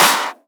Claps; Vengeance - Essential Clubsounds Vol.5

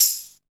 RAGGA; AMG - Now CD-ROM (Roland); Soun'Bwoy!; Ragga Samples

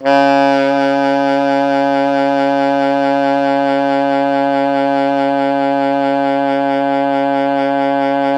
Pop Brass, C#2 -R; Best Service ProSamples vol.19; AKAI

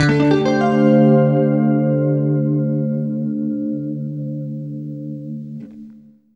CLEAN DM9, Guitar; East West; Steve Stevens Guitar Samples Collection

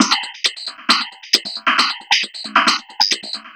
Drums Strange

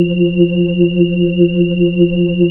B-3 Chorus

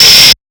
Hats, Alesis Performance Pad

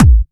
Trance Kicks

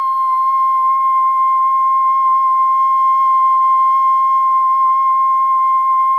solo boy; Spectrasonics; Symphony of Voices

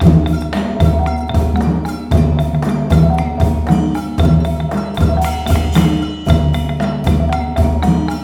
MALAY R, Heart of Asia; Spectrasonics - Heart of Asia CD2

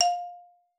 F4, xylophone; Xsample VOL-4

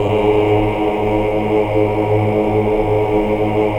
AAH, Hallelujah, AAH OCT; Best Service

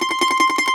MAND TRM, Mandolin; E-MU Producer Series Vol. 7 – Old World Instruments

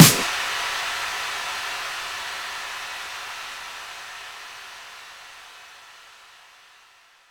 Impacts, Effects

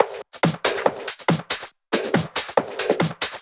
Loops 140 BPM Hihat Percussion Loops

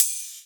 Hat

